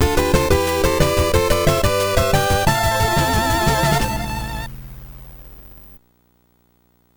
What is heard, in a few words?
Stageclear; Shinobi non Grata